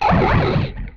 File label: Sci Fi Gun, Blast; Space Blasters2D